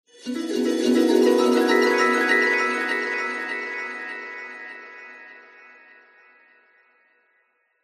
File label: sound design magical rising twinkling nostalgic